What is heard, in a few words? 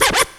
SCRATCH; Crypton - DrumBase5000; DANCE3+TB303